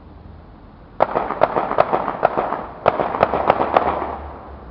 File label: gunfire